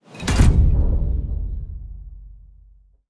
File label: Platform Lock In Place Bottom; Scraprun; Deathrun